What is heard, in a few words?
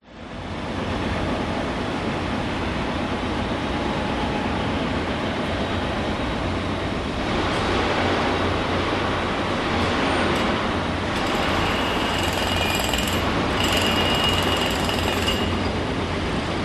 public places city large construction site skyscraper jackhammer close by sydney; australia